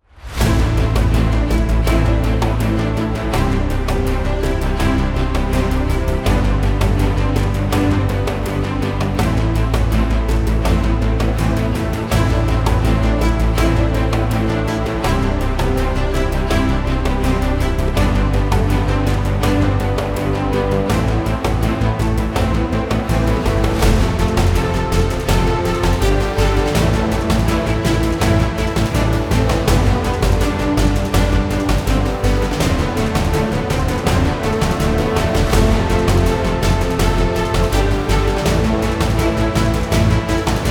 combat

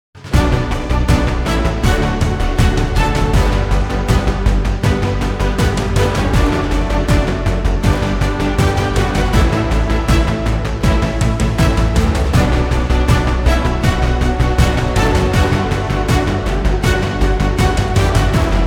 combat

